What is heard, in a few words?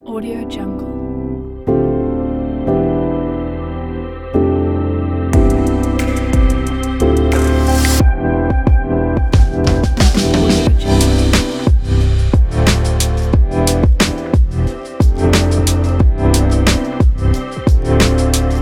chilled hip hop